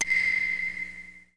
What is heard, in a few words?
Sound tick